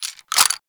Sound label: Revolver Rifle Reload, Weapons; Warframe; Tenno; Mod Quest